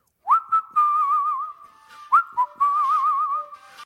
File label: whistle sms; Barfi